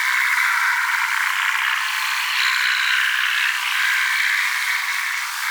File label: Medusa Puzzle Dome Loop; Warframe; Duviri